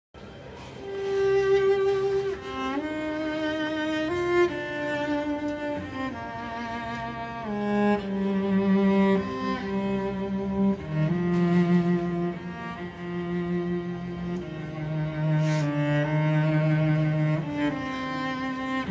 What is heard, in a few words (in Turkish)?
Enstrümantal fon müzik Umutsuzluk